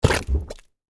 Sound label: Texture, Bubble, Gurgle, Mud; Nature; Water; BlastWave